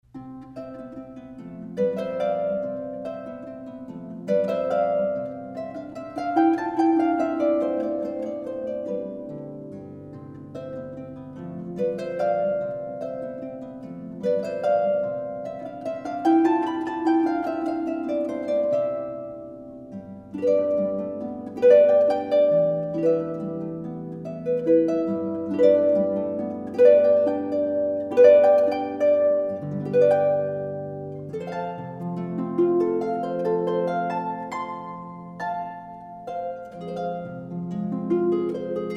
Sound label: Flower Duet; Delibes